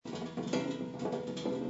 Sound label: Roll Wood Metal; gamerip; Ballance